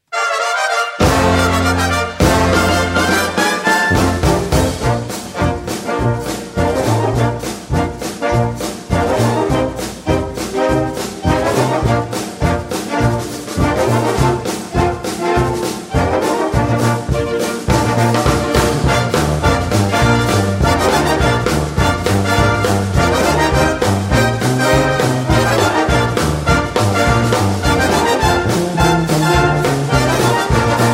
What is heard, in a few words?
Tutti Rock; dubronx